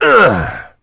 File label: heavy meleeing; tf; duke